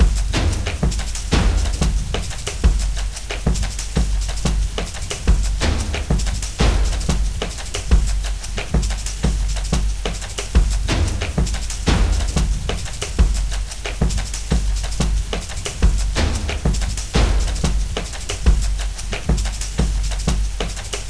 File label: monsoon Layer; jumanji